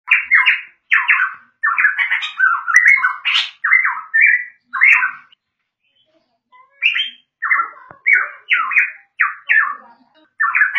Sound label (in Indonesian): suara masteran cucak rotan